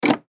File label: Arcade Racing Game, Arcade Stand Up Racing Game Gear Shift Low To High